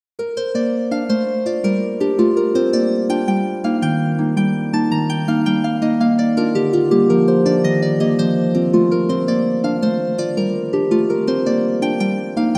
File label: jig